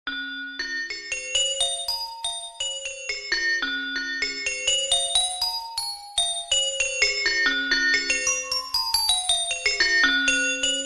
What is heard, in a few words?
Bells